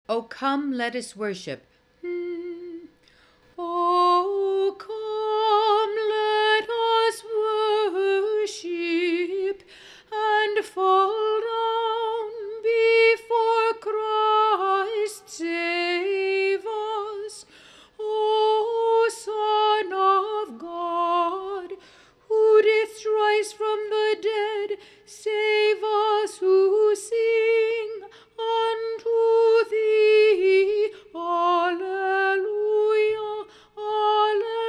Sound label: soprano, choir; come let us worship